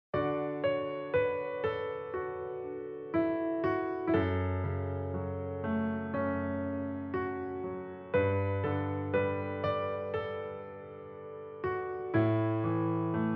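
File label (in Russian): красивое и успокаивающее открытие фортепианного повествования фонового звука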